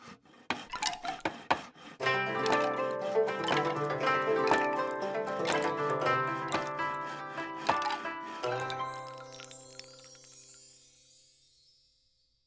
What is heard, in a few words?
Construction Jingle; B Path of the Teal Lotus